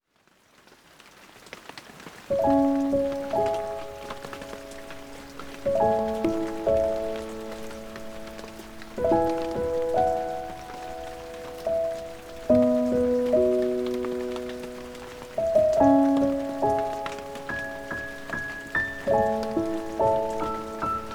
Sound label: Piano, With Rain